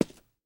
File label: rails, walking; Factorio